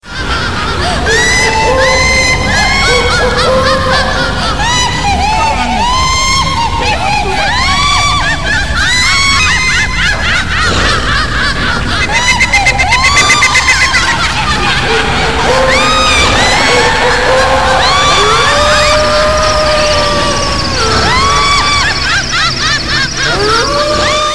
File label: Vampire Scary